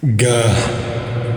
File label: guhhh